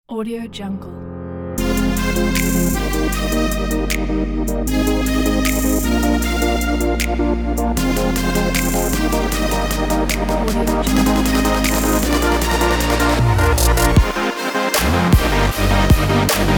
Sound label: future bass; الکترونیک; موسیقی بی کلام